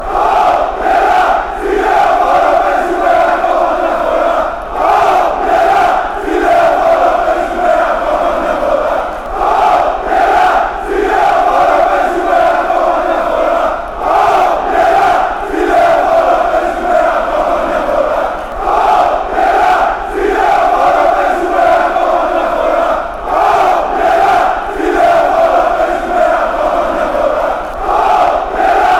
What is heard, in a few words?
panathinaikos chant